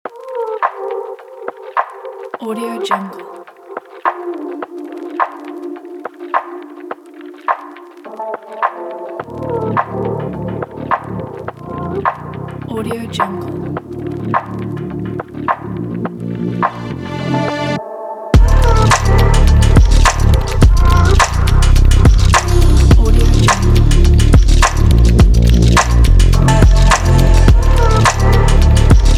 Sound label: soft future garage